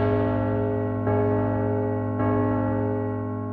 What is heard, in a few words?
Bell